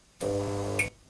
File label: Fluorescent start